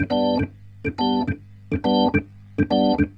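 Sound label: organ, B-3, 70 bpm, roots reggae; Sounds Good - Roots Reggae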